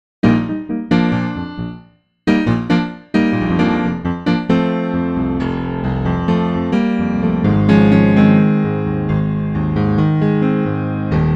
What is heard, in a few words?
YC5 Rock